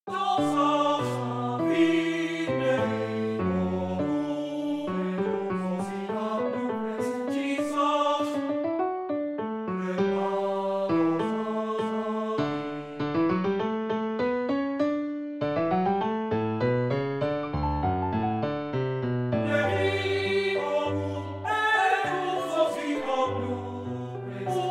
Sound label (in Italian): Lied per Canto e Pianoforte Allegretto; Walther